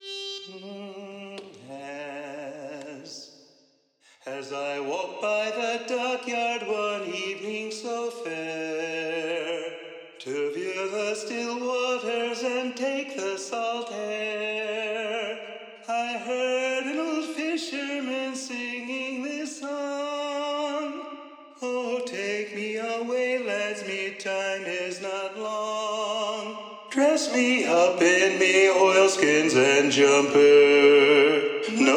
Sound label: Bass Predominant, sea shanties